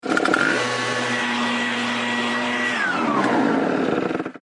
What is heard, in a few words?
leaf blower